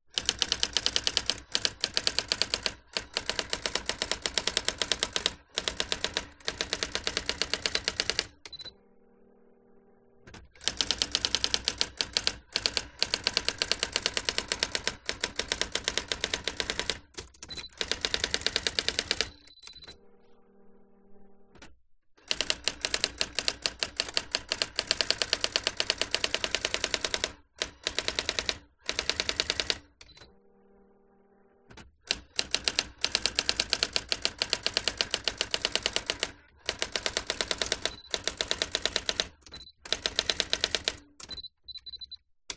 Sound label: electronic typewriter typing fast sequence brother ax 450; office